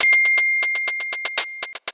iPhone SE Notification Ringtone

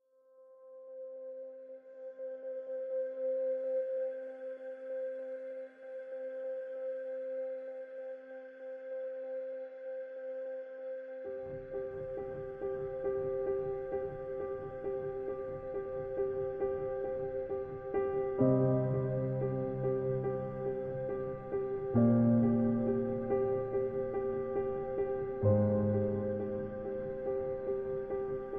Piano Ringtone

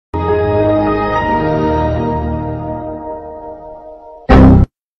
Windows Ringtone

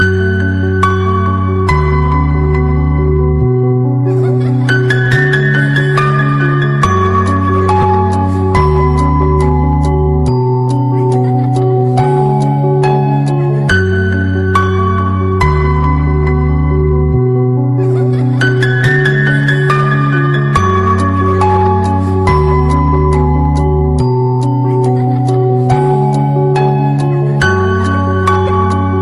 Sad Ringtone

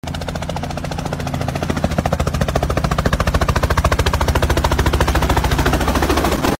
Helicopter Sound Ringtone

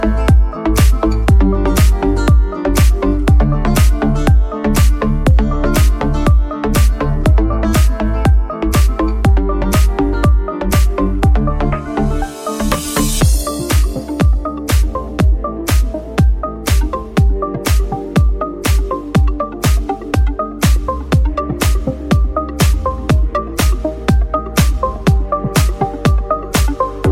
Bhangra Electro Ringtone

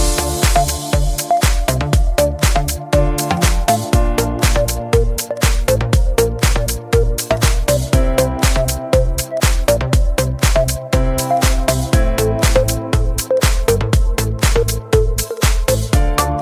Dubstep Ringtone

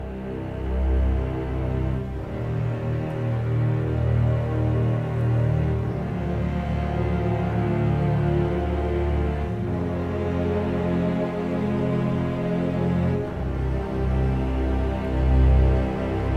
Violin Fusion Ringtone

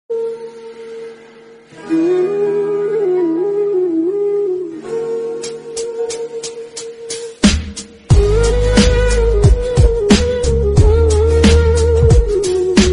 Sad Music Ringtone

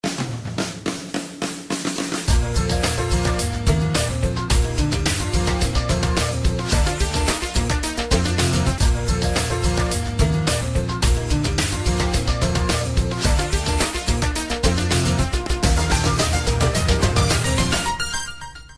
Tecno Camon 20 Premier Ringtone

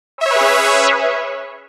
Funny Sms Alert Tone

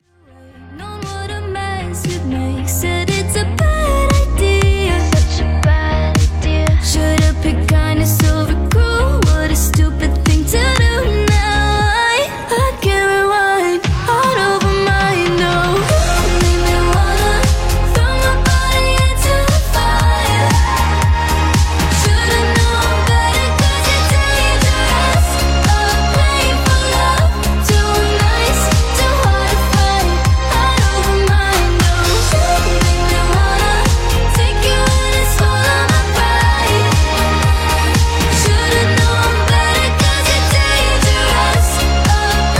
Caller Tune English

